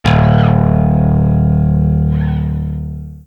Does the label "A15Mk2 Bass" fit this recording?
Yes